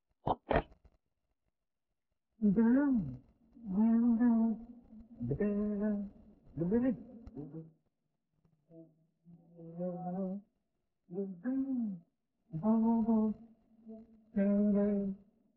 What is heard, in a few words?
vocals